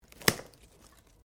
Branches Dead Snap; Material components for constructing more complex sounds; Snaps; Objects

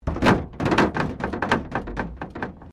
WRECK DOOR PANEL RATTLE METAL, Wrecked Cars; impacts, crashes, scrapes; Sound Effects:Objects

